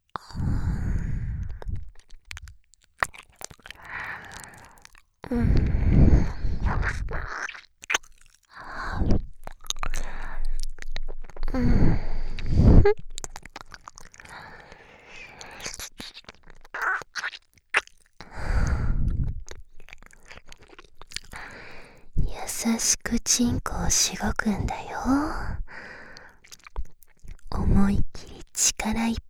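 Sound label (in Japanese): 【効果音無し】耳舐め（右耳）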